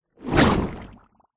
cartoon whoosh into wet sloppy slimy bubbling impact; zapsplat